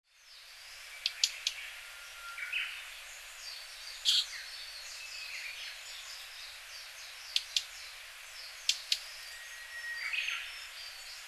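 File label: blue-and-white flycatcher, hyogo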